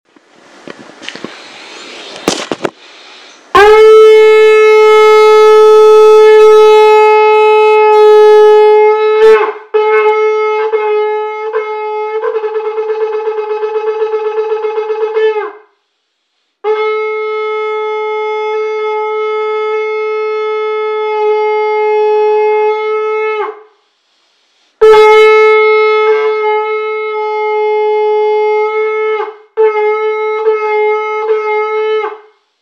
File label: shofar; hazanut; sefaradi; roshhashana